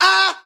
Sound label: "ah"